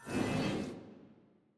stacked Wilds Main Outro; arcane

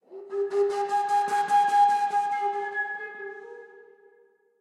bird Flute; templeofnudges

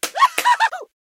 clap; irish pot luck

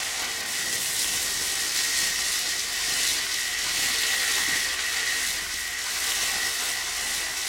super Mega Win Loop; arcane

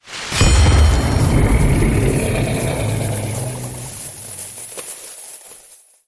super mega win text change; jumanji

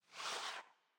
win Presentation Animate Card In; arcane

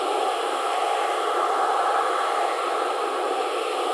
ambience Add

